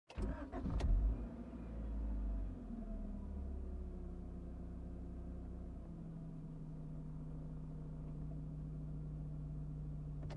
Driving in sports car

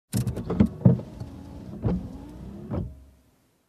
Turning on Windshield Wiper

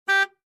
Car Horn Short

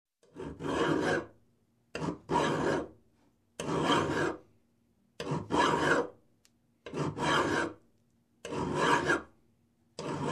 Hand sawing a wood single strokes

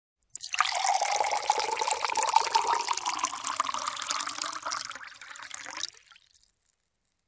Pouring water in glass non carbonated

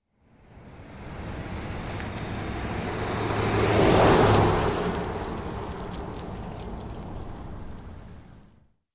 Car driving by on dusty road

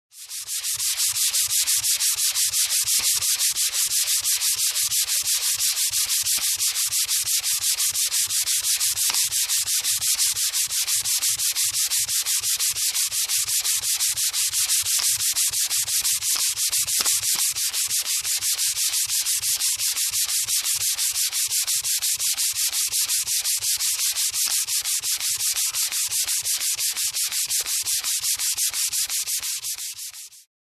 Construction

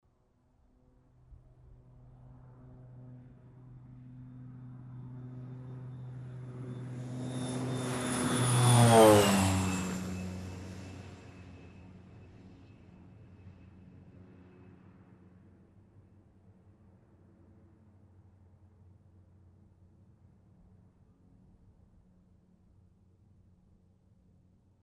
Light Aircraft Flying by whirring sound

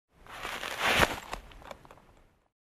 Box of clothes put down

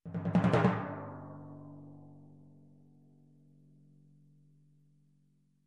Short Timpani Roll

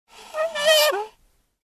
Single Floor Creak

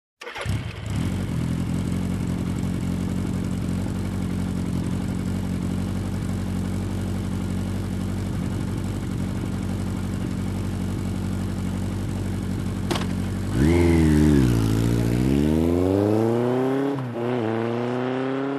Motorcycle engine ignition and driving away

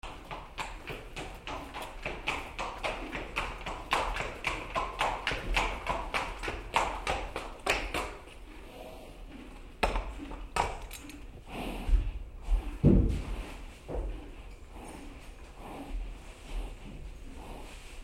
Horse being unsaddled

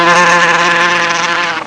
Wasp sound

